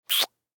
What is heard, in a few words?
kiss; smite; chiron